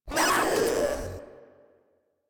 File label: Proto Infested Runner Pain; Warframe